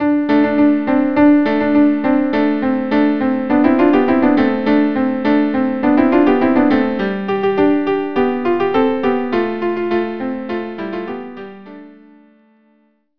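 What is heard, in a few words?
Debka violin viola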